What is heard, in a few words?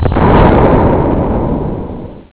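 big explosion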